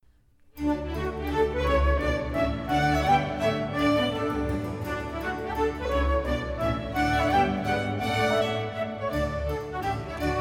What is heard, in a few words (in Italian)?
Concerto Per Flauto Allegro; padre giuseppe paolucci